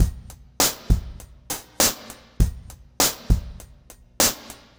Remixed Drums 100BPM; The Roots Drumloops

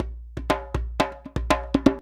119 JMBFNK; Univers Sons; Basicussions

